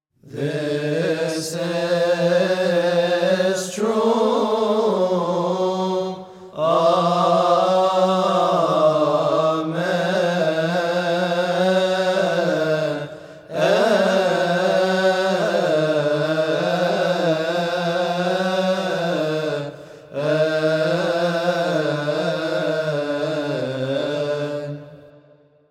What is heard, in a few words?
This is True; Institution Narrative Holy Body